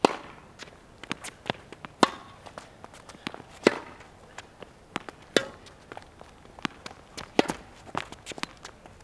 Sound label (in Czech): tenis; Mafie 1